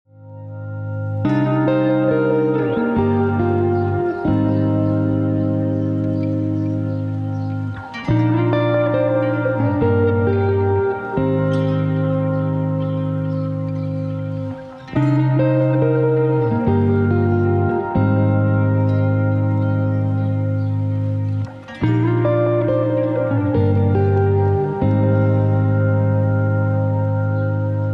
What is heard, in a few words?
lost ambient lofi